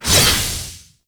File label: scythe whoosh; Exalted Archwing Scythe; Melee